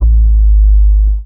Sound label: scratch jack, Kicks